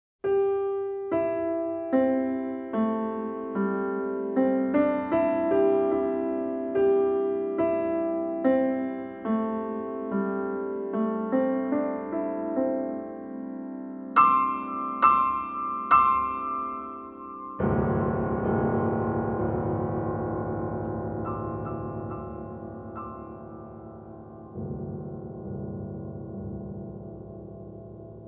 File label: piano